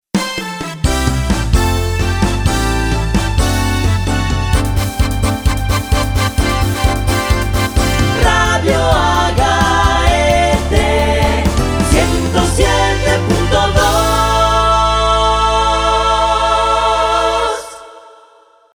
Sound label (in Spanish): jingle radio; radioagaete